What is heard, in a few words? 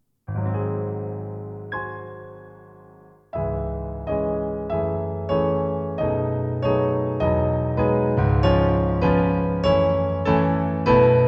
melody, piano solo music, romantic poetic